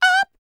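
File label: falsetto, R&B Dance; Spectrasonics Vocal Planet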